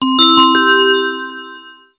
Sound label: School Class Change